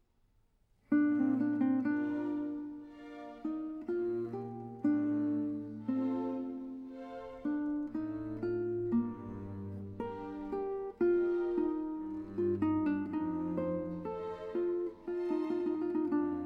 Harpsichord Concerto in F minor Adagio

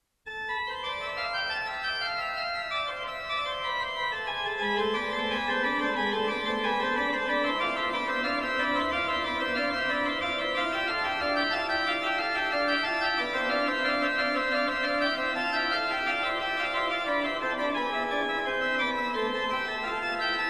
Prelude Fugue in A minor; BWV 551

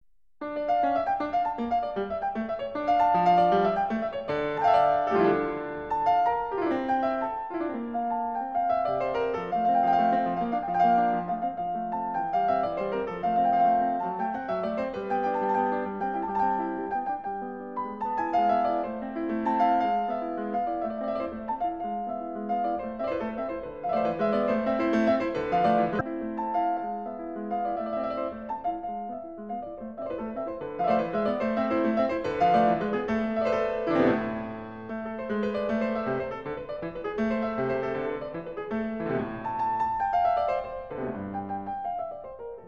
Piano Sonatas Modo dorico d moll

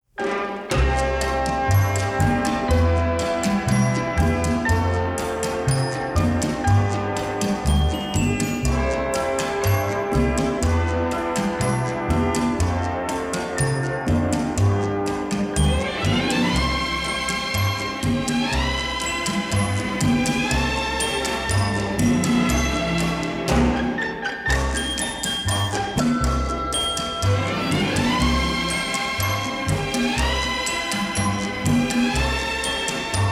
Strings Orchestra Trumpet; Around the World in 80 Days

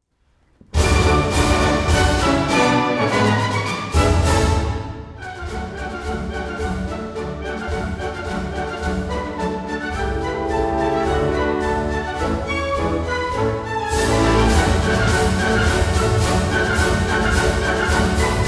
Orchestra, March

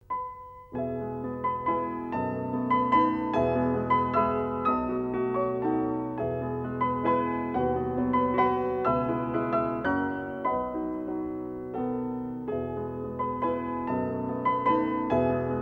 Waltzes for piano four hands in E Major

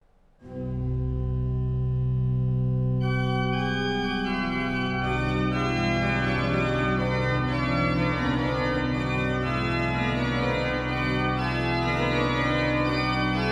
Toccata

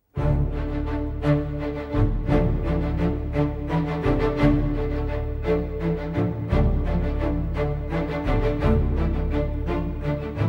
Orchestra; London Philharmonic; Elder Scrolls Oblivion

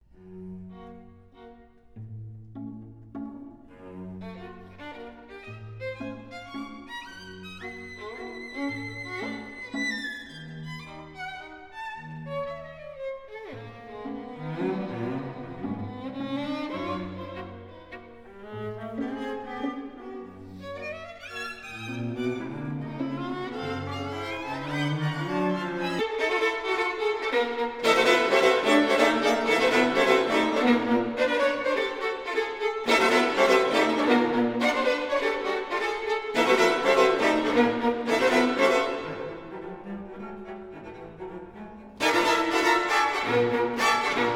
Tempo di valse Moderato Con eleganza; Un poco capriccioso

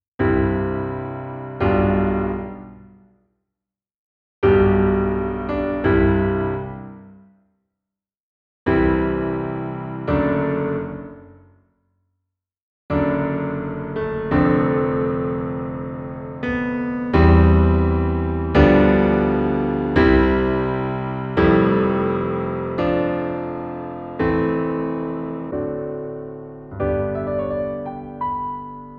Piano Sonata in E Flat Major Scherzo Allegretto; Trio

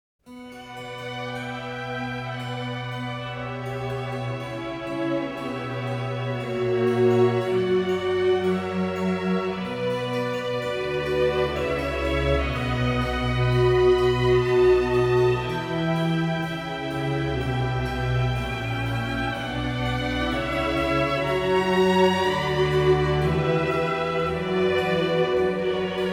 Violin Concerto in D major Andante; Claudio Scimone

